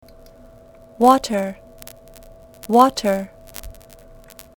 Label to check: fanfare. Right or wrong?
Wrong